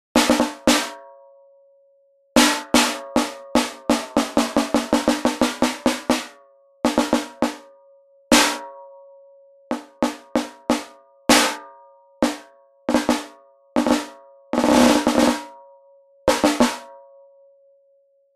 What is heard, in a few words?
Chromaphone